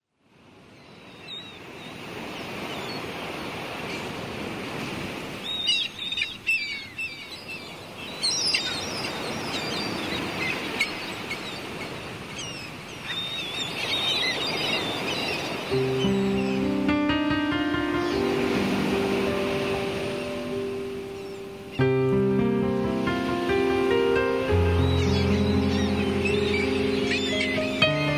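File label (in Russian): Пианино звуки природы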